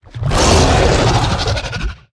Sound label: Hydraladon Secondary Attack